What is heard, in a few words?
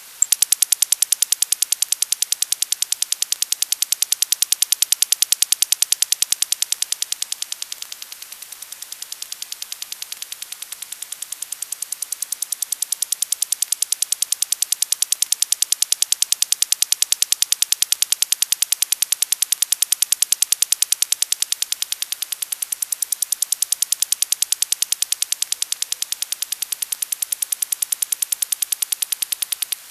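Russian 10Hz 10kHz sounder, IONOSPHERIC SOUNDER, 10 kHz BW, 10Hz REP. FREQ.; RUSSIAN MILITARY 29B6 "KONTEJNER"; OPERATED FROM A TRANSMITTER NEAR GORODETS/RUSSIA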